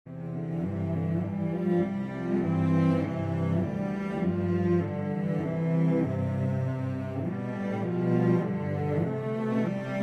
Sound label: Spring Medley Cello C Major